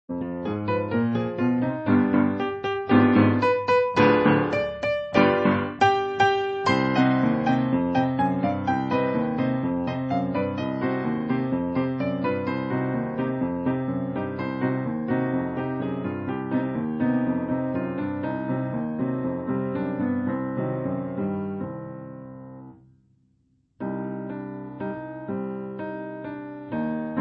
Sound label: Piano Version; Ghosts'n'Goblins - Cauldron